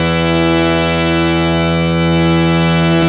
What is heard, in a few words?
HARMONIUM, World Instruments